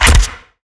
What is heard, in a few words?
timberchain impact; weapons; dota; shredder